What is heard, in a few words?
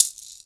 Maracas; Digital Sound Factory Virtuoso